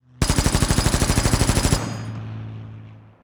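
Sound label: battle air attack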